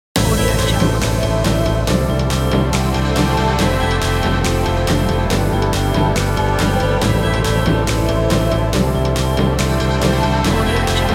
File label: future bass